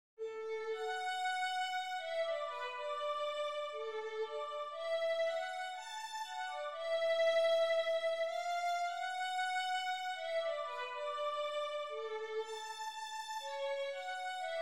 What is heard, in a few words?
concerto b minor violin